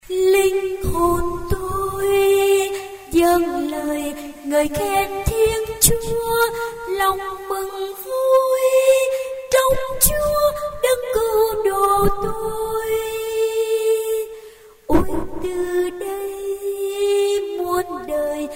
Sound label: sop alto